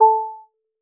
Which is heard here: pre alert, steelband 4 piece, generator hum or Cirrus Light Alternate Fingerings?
pre alert